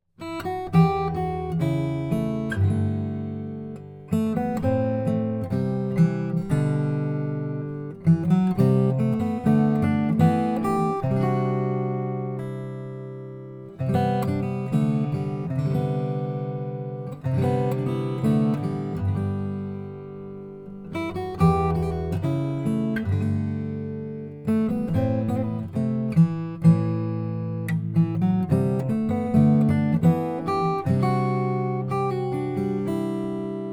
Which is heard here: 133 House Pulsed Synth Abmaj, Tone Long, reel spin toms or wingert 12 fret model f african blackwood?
wingert 12 fret model f african blackwood